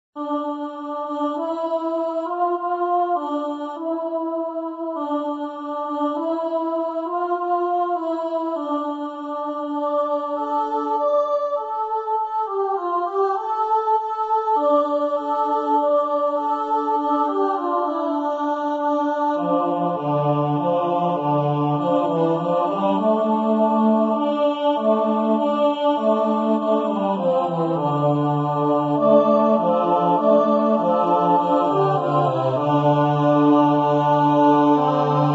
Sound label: Vocals Only